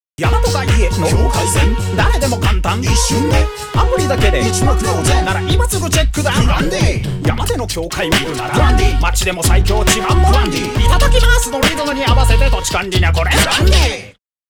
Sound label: DANCE MUSIC